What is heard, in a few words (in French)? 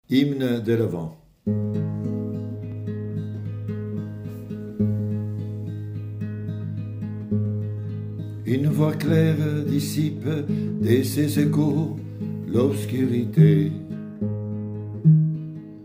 Hymne de l Avent